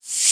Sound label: Slider in; Apache; Slide Sounds